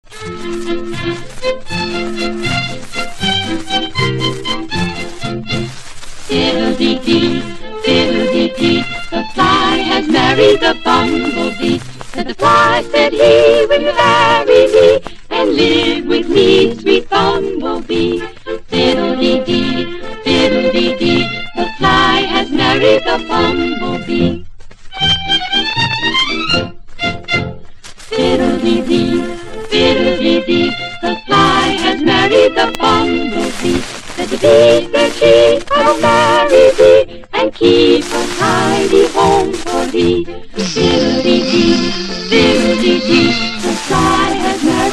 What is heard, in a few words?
Chorus